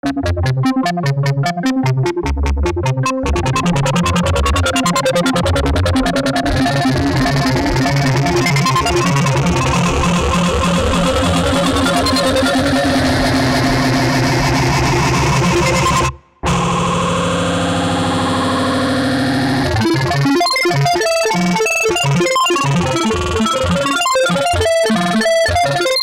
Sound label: dimension warp